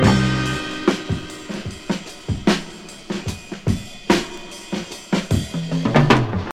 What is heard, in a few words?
74 bpm breakbeat c key, Free breakbeat - kick tuned to the C note. Loudest frequency: 1018Hz